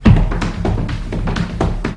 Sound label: long footwork